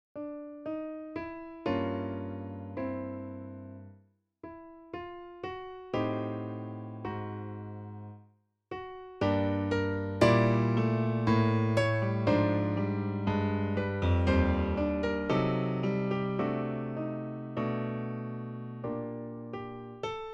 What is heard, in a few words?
An example with major/diminished chords on V in a minor key